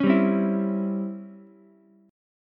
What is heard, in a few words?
Db Minor Major 7th Flat 5th Db down Ukulele; DbmM7b5 strummed; JGuitar